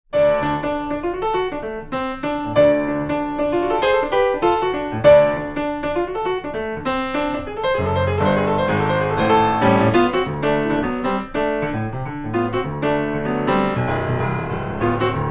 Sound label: Blues